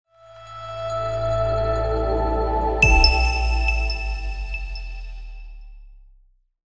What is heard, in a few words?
Abstract Ambient Logo Reveal; آهنگ لوگو موشن; موزیک لوگو